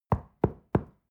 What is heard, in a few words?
stone wall knock